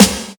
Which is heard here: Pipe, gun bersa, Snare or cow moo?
Snare